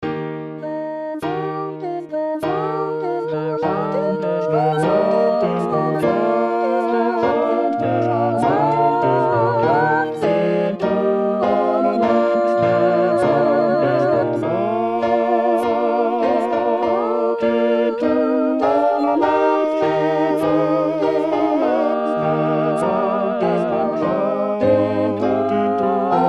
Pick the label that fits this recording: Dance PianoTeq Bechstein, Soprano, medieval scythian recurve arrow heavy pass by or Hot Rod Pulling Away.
Soprano